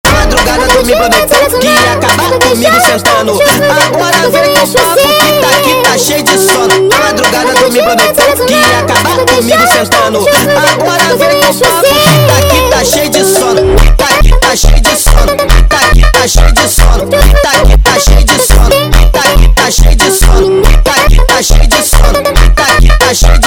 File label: Funk sped Up